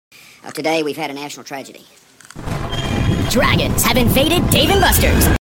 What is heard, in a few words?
dnb